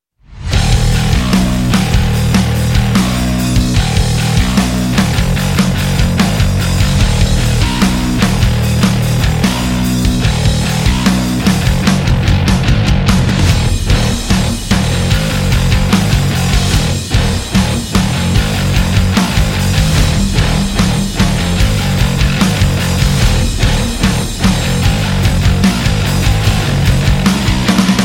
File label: drums and bass